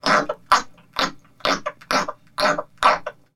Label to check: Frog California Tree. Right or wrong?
Right